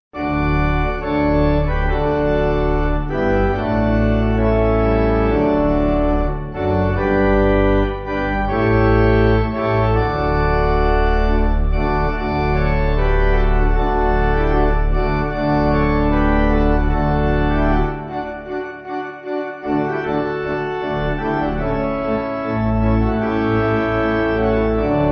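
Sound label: Pipe, hymn backing; Joy To The World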